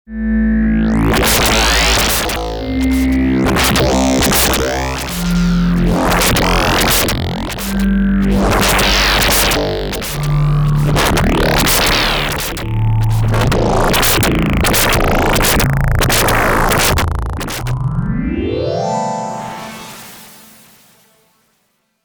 Elektron Digitone Glitch Pad

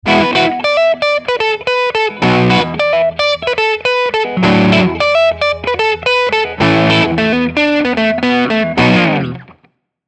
Gibson Robot LP Neck Bridge OD

